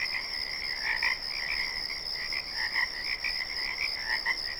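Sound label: Hub Mansion Frog Ambient; the princess and the frog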